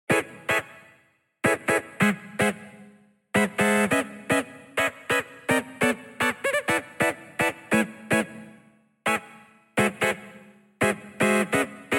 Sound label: synth chords loop sakura pluck C#min; Vocal UK Garage by Dropgun Samples